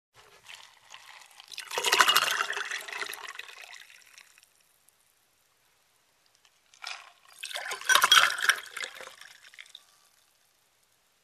Filling up two glasses

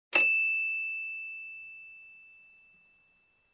Microwave Ding

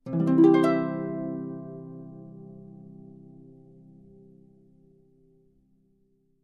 Happy harp chord strum

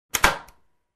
Compartment close click sound

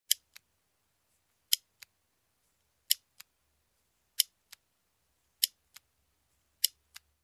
Empty gun triggers

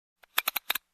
Camera Control Adjusting

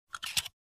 Small storage box open

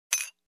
Ice Cube in Drinking Glass

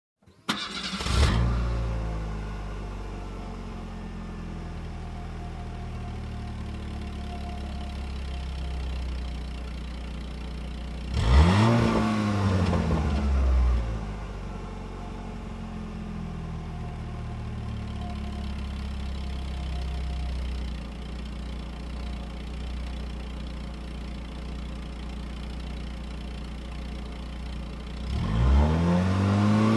Revving up car engine

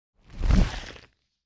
Bird landfall

